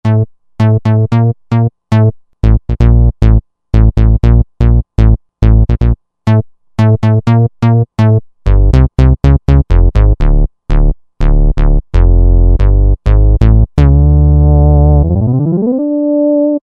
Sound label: 4pole Bass